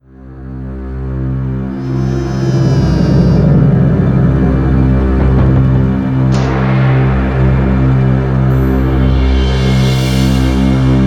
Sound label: Stinger Drone